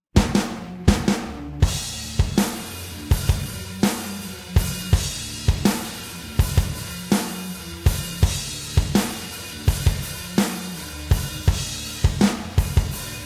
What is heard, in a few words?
drums